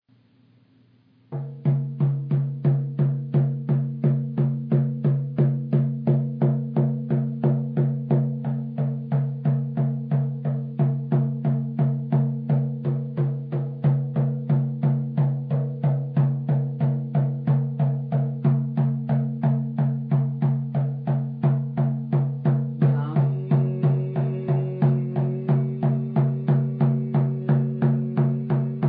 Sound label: Grounding Drum